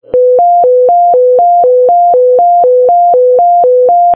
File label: hi lo tones